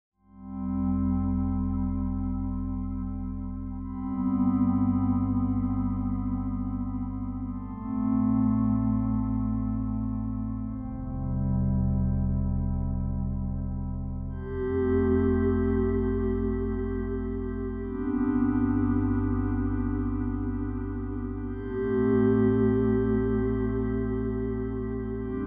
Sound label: Blossom Pad